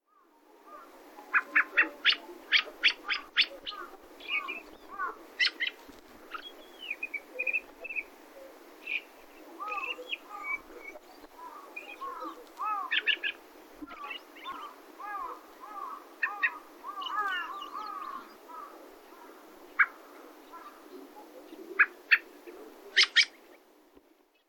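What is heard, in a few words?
Dusky Thrush; saitama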